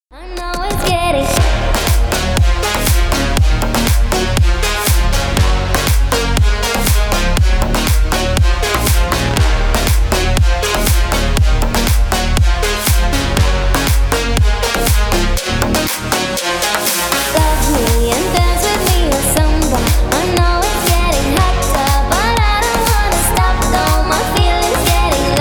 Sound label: samba